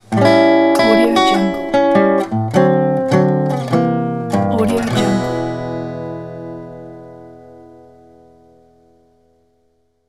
acoustic guitar blues links

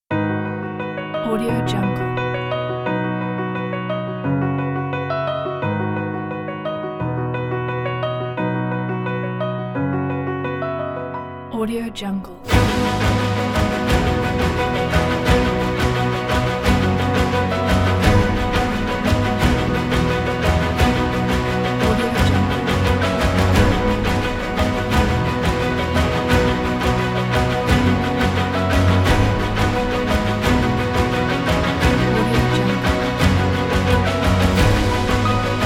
inspiring cinematic

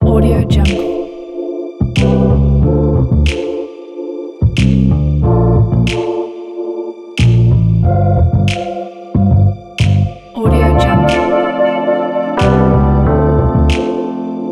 chill lounge soul lofi

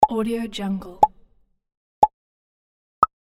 countdown pops 3 2 1 go

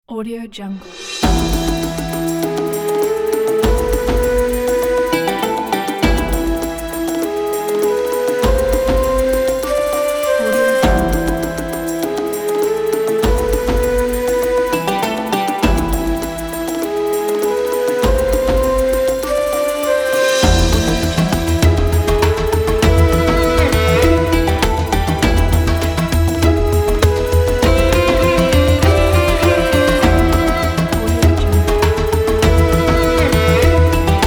arabic

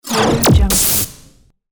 blaster shot

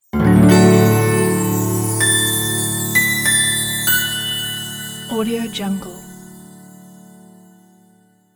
magic melody christmas effect

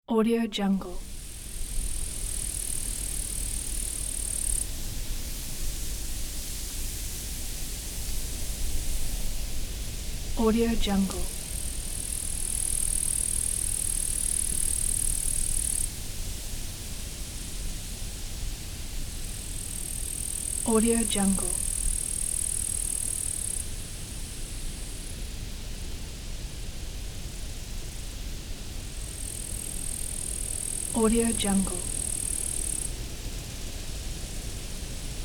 night wind

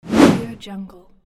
change screen

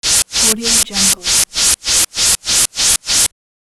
wave oscillation of noise

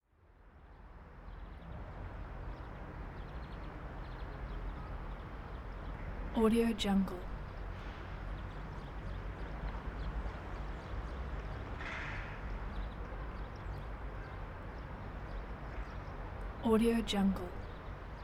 medium distant city ambience with a syren

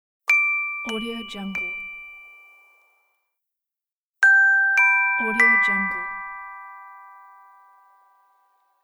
soft bell notification melody

